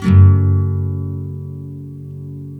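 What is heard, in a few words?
NYLON, G# MINOR UP, CHORDS; Spectrasonics; Hans Zimmer Guitars VOL-1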